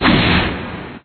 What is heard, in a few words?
rocket Damage